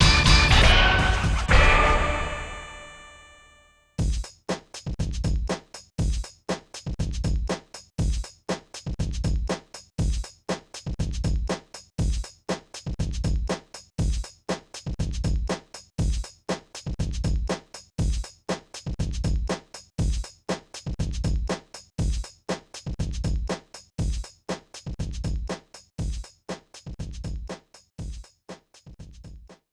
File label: Fail; Game & Wario; Wii U